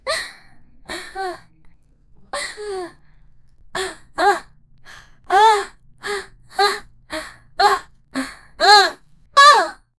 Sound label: sound of a girl fighting